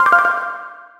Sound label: start; pinball